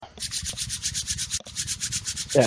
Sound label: hand rub